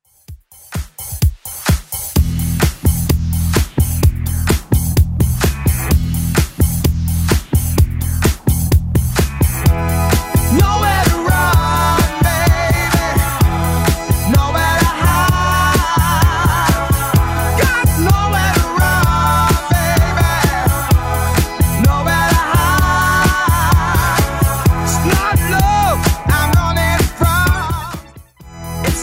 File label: 80s Club Edit 128bpm